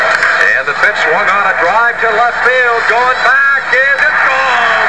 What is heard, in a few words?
Home Run, Baseball; MacPro Baseball 1.11